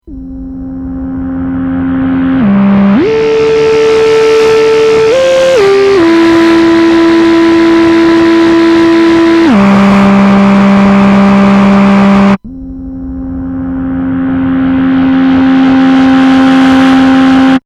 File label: synth2 melo; mfb synthII